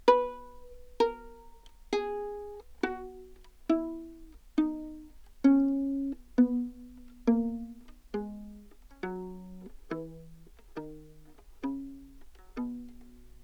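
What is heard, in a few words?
baroque viola descending pizzicato; OPENAIR; Anechoic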